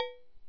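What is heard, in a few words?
PAPER begin loop; Midway Arcade Treasures Extended Play; psp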